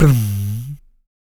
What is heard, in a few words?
PERC, MALE PERC